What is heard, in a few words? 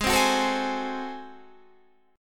Ab 7th Flat 9th Guitar Open D, chord